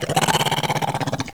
Worm

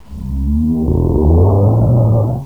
Giant

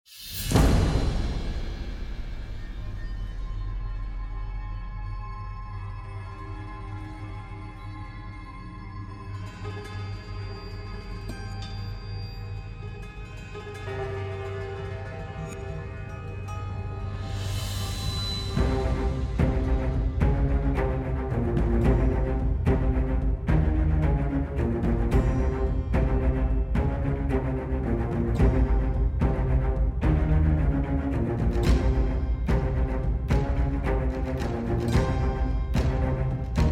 fight music

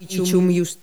Ice Queen Attack

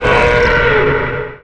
Pain

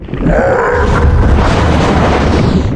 Attack

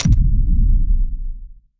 ui epic interaction

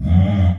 Attack; Argon

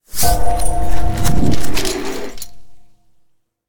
ui reward

